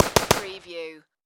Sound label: Machine Gun AK-47